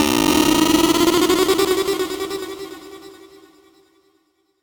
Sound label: Synth Fill F